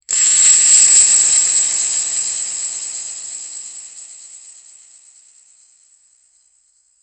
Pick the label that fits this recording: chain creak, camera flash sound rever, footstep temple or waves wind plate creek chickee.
camera flash sound rever